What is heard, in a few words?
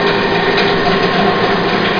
CONVEYER; CD Action; shadow